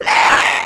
stalker pain; npc; Half Life 2; Episode 1

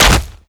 step close, zombie blind; Half Life Alyx; creatures

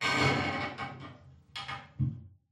wood creaks; Half Life Alyx

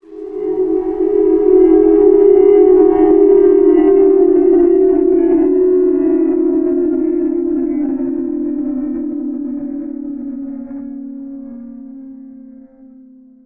teleport winddown; Half Life Model Pack; Half Life 2; ambient levels

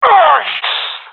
death; Counter Strike; CS:GO; professional epic

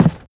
step run, footsteps cardboard

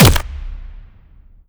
bolt huge blast

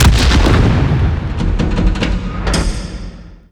start explo, smokestack; destruction; Half Life Alyx

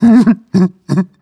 breath long, zombie

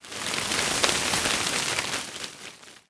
foliage react explosion

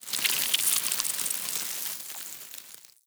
swarm large, cockroaches; critters

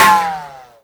ricochet, bullet impacts; physics; Half Life Model Pack

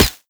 foliage, bullet impacts; physics; Half Life Model Pack

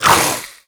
bold hiss, headcrab; creatures; headcrab classic; hideout

